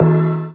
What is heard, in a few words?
VEE Percussion; Percussion Instruments